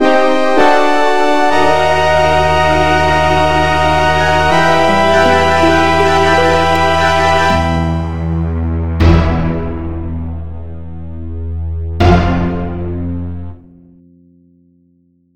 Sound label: Background music